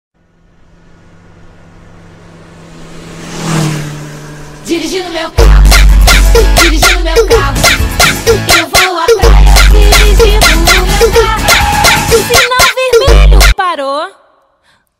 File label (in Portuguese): Funk